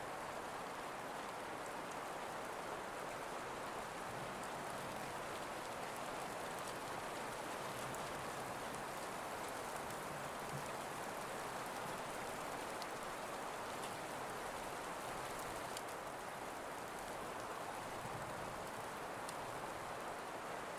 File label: rain